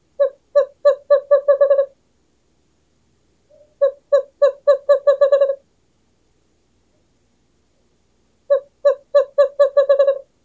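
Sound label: song, Western Cowbird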